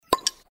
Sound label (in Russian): otkrytie butylki; flensburger